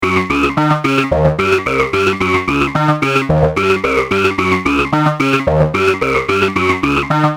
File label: vocal; synthmodules